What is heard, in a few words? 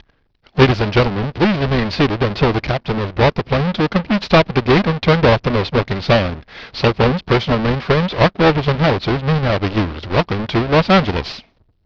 kazoo Filter Voice